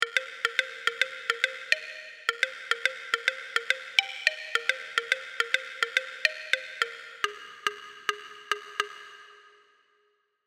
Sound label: serum plucks; chiqui audio